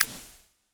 ui page scroll; Counter Strike Model Pack; CS:GO

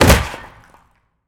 strider steps detail; creatures

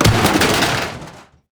metal container break; physics

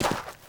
sand, footsteps; player

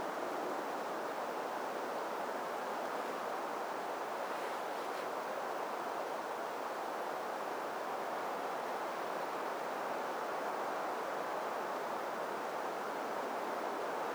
exterior; ambient; Counter Strike Model Pack; CS:GO; nuke

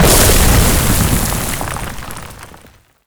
erupt, antlion dig; creatures

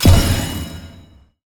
xp milestone; Counter Strike Model Pack; CS:GO

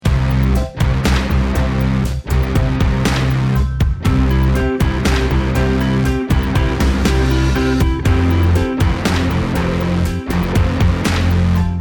start action, music

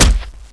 foot heavy, antlion guard; Half Life Model Pack; Half Life 2

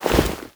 arm oneshot, foley

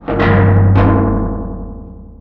cable rattle; ambient; atmosphere; Counter Strike Model Pack; CS:GO

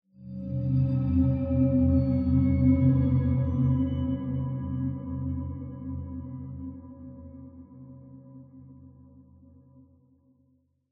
amb startup metal; Half Life Alyx

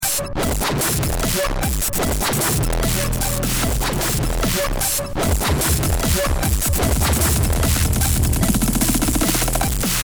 bomb ten sec count; music

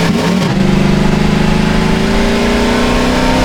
second, v8, vehicles; Half Life Alyx

